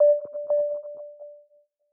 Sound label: Checkpoint